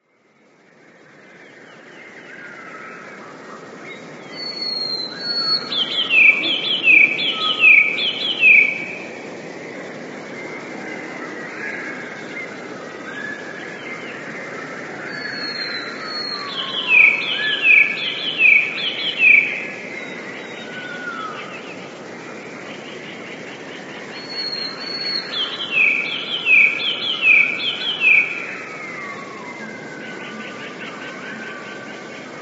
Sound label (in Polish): spiew; 2-krotnym zwolnieniu i obniżeniu o oktawę